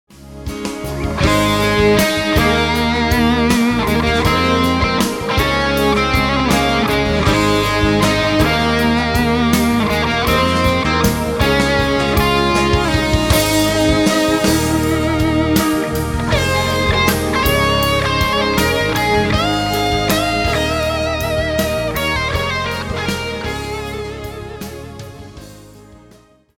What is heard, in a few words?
Solo; LP